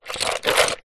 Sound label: shells small stones plastic bucket short movement; foley